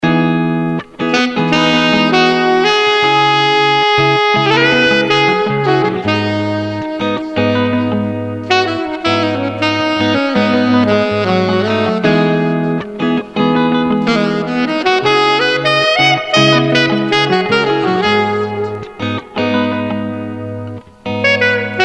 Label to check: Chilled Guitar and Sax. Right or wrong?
Right